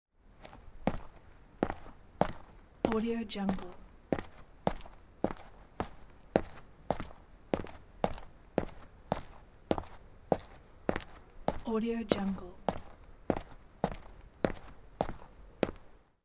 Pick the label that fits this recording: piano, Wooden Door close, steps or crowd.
steps